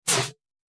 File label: Short, Light Fire Extinguisher Air Blasts, X5